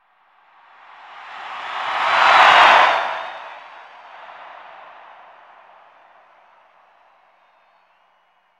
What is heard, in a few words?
Arena Crowd; Crowd, Huge Cheer With Doppler By.